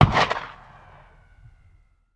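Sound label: Cannon Black Powder; Sound Ideas; Guns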